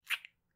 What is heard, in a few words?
smooch